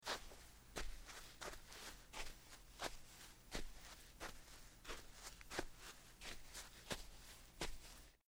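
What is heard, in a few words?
barefoot; rotbad; infrarot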